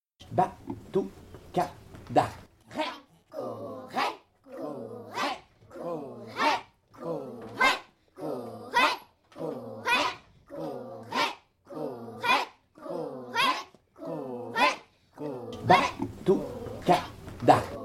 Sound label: batuc rythme; les voix des CP CE1